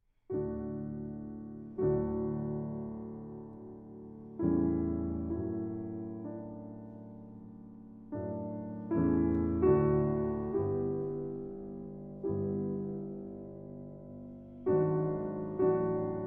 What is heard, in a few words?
Piano Trio in B-Flat Major III. Andante Cantabile Ma Però Con Moto; Op. 97; Archduke